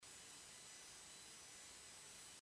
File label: USB Noise